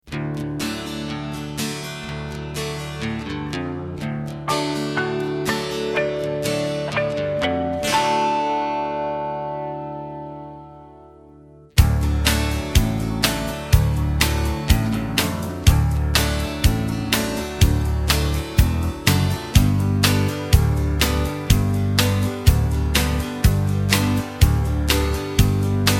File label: (D) No Backing Vocals